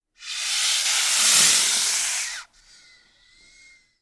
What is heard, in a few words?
Boa, Albino Hiss. Close Perspective.; snakes